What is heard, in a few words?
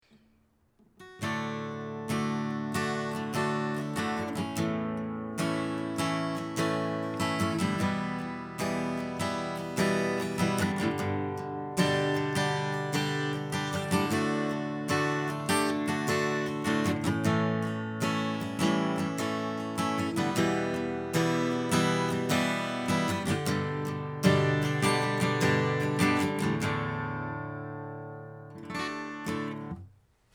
acoustic guitar; EPOS B20 cardioid